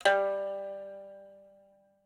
pipa

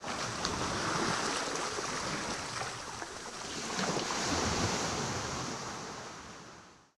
wave

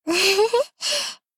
laugh

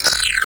open

